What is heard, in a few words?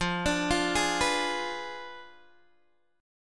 F Diminished 7th F down Guitar Open D